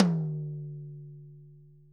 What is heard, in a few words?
ROTOTOMS; Sonic Reality - Vintage Timetraveler